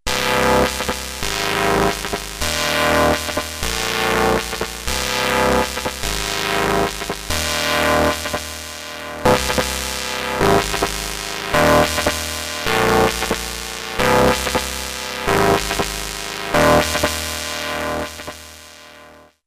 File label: hpf; subconscious communications; model53; f1 hi pass